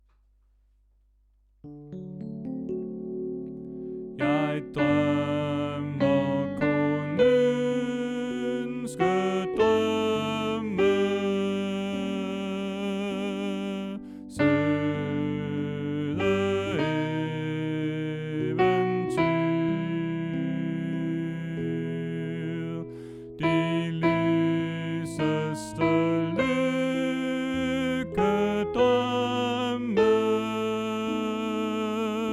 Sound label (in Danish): Sopran